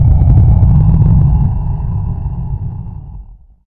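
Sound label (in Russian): левиафан вой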